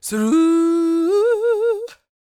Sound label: CROON, Soul; Spectrasonics; Vocal Planet; Blues; CW SOUL